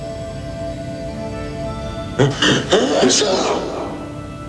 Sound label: sneeze; worf